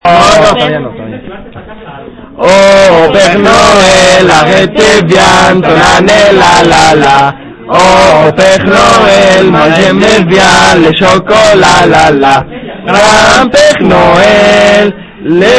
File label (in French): Chant Noel